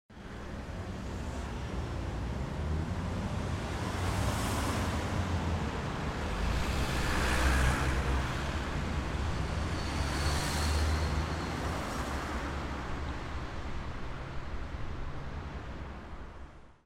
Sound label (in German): Strassenkreuzung; Pocketrak CX